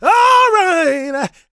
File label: GOSP MALE, Vocal Gospel; Vocal Planet Vol1 Gospel